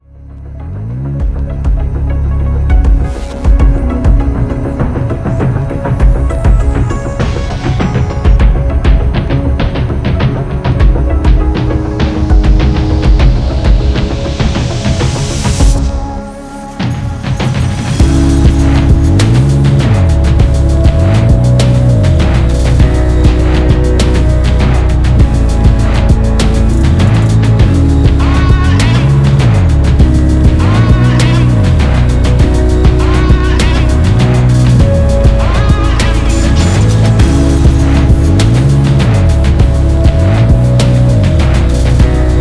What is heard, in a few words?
Cloud Rap Hip Hop